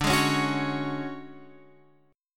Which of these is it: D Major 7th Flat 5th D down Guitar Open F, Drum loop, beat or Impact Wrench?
D Major 7th Flat 5th D down Guitar Open F